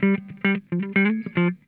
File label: SKIN DEEP, Funk Guitar; 90 s Sample CDs; Zero G